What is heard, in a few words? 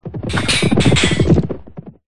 horsemen; c-evo